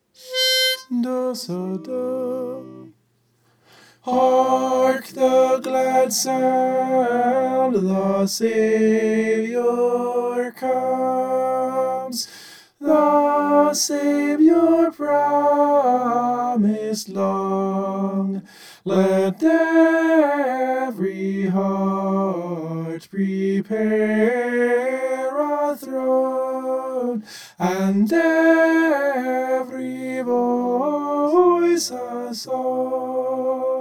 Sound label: hark the glad sound soprano